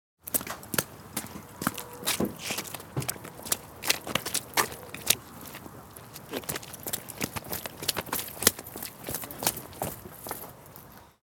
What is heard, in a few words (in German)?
schritte bewegung pfuetze